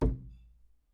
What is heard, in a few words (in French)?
Bois meuble coup bass; Percu Bois